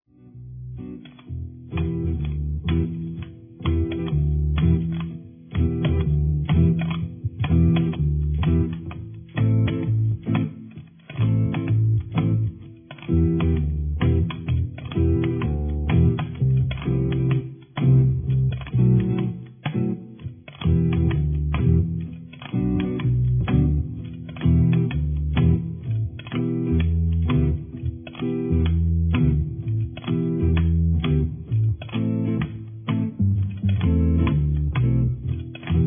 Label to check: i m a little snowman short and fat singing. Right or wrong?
Wrong